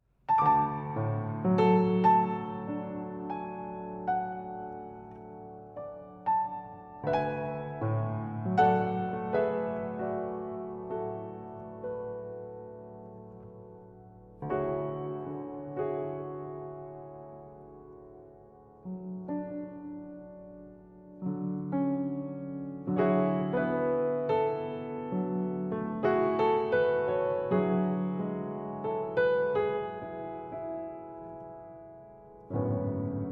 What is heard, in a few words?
Improvisation; Improvisation VIII (7 july 2015); CONTEMPORARY DANCE